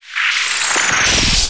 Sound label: full throttle